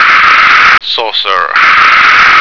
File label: saucer